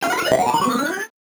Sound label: VocoBit Noise, 8-bit; musicradar; 8-bit bonanza